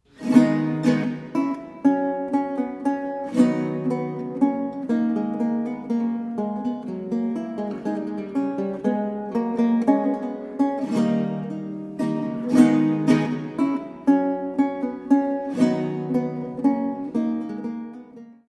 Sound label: Losy Menuet